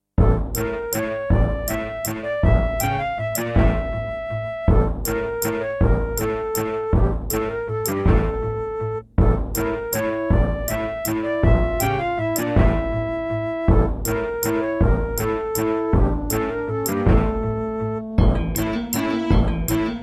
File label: Pine PT-202 Vivaldi Wavetable DB